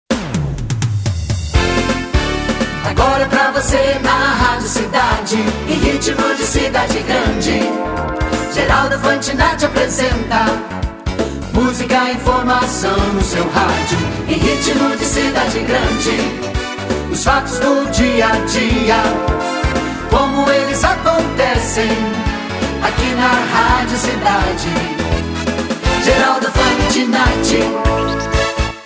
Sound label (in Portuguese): vinheta